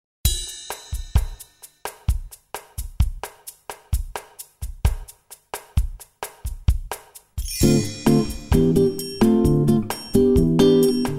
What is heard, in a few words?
Latino; MyVirtualBand